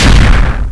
悲鳴

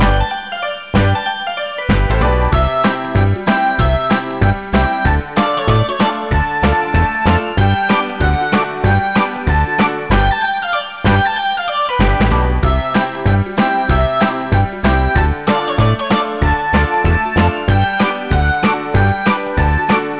ukiuki

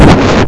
bakyun; みんなでバトル

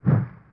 kaze

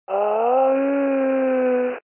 zonbi

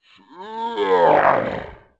suzu

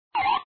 pyon

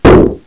爆発

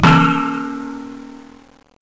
tarai

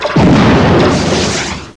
戦闘メカ爆発; マジカル刑事

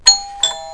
ピンポン チャイム; マジカル刑事

